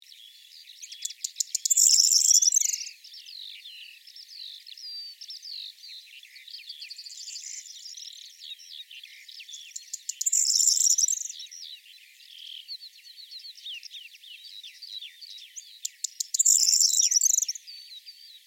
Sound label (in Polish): potrzeszcz śpiew samca